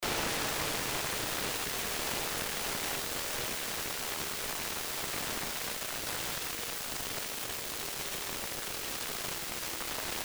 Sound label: electric gamelan SEA RAIN